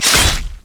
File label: basic attack; LoL; Nocturne